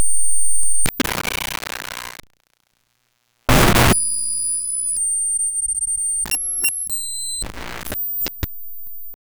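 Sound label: broken wifi glitch television, glitch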